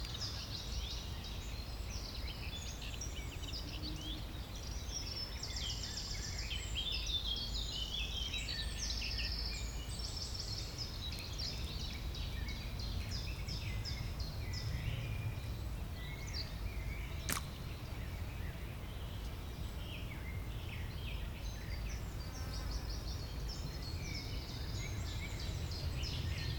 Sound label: Natural Pool Field